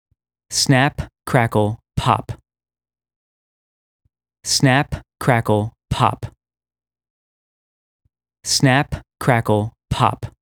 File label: Drill Music, Snap